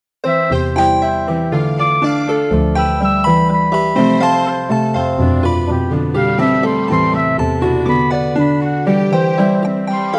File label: triadic dance in three time